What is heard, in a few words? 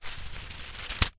household baking grease proof paper unroll roll out on ground